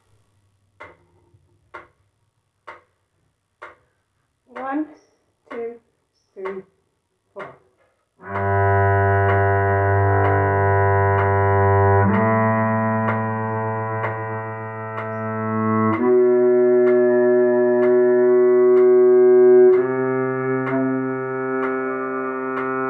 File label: Cello G scale